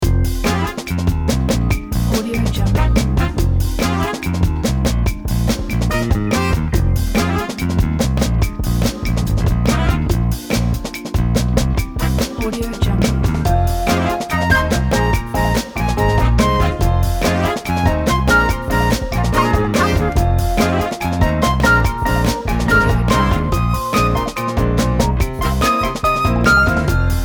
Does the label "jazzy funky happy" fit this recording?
Yes